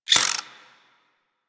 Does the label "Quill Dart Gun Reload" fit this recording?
Yes